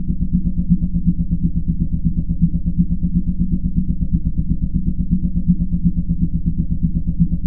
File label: station ambi; Earth and Beyond; EA Games